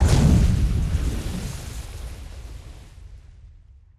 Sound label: water splash distant; destructibles